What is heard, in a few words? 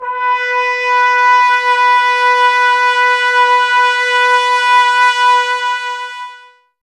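brass trumpets B; 90 s Sample CDs; Miroslav Vitous; Woodwind Brass Ensemble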